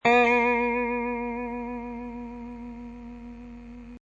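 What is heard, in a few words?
vibrato, guitar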